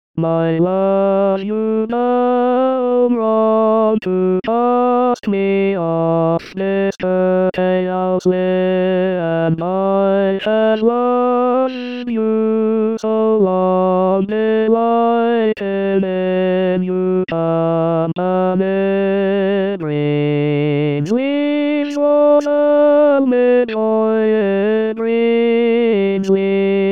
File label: tenor; greensleeves; rimailleurs